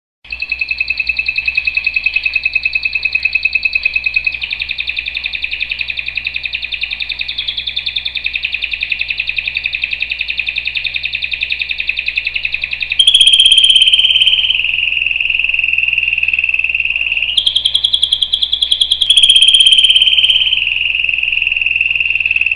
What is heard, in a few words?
Canary, Bird Songs